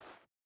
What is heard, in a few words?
footstep burlap sand jump start; Rust